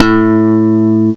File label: ELE BASS; M8; CMI Factory